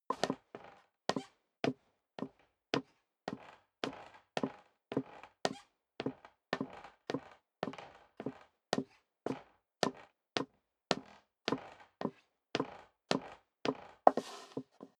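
step high heels wood creak upstairs sequence, footsteps; schritte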